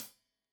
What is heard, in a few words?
Hat Tight, Hi-Hat, Cymbals; Deeply Sampled Drums